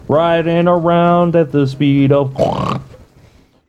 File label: pig noise